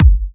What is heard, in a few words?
Bassdrum; Vengeance Samples